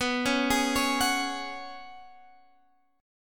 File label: Gb Suspended 2nd Suspended 4th B